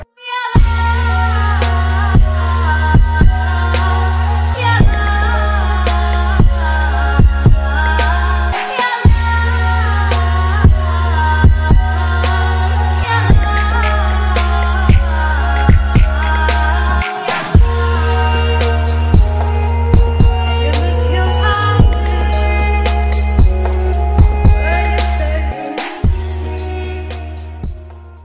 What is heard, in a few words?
Mood Off Music Ringtone